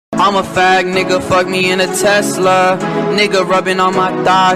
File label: sus song